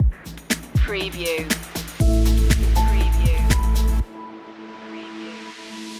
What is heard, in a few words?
calm cool collected stinger